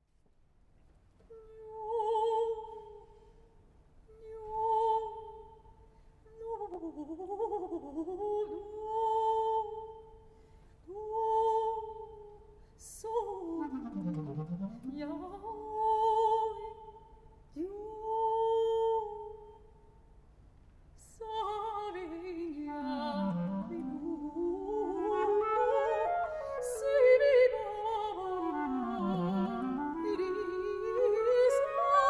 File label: toccata